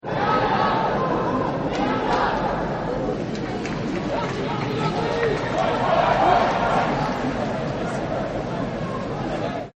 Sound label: Crowd Chants; Crowds; Backgrounds